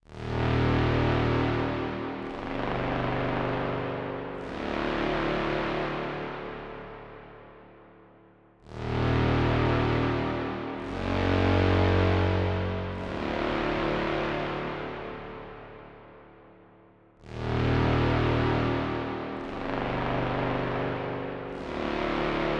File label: Horror Pad; Draw a Stickman Epic 2